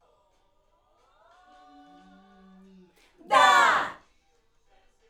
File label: Backs AKG